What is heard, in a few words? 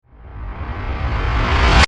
Horror Reverse Ramp Digital Warble; Production Element; Scary Soundscape Sound Effects; SFX Bible 6